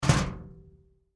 trash metal

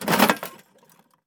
box pick

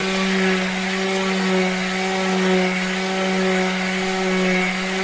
sand loop

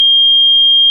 key buzz

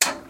computer on

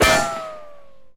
bullet, ricochet; Weapons

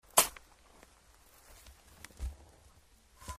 shovel

